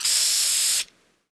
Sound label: sprayer; player